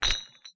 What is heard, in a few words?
Ball And Chain Rattle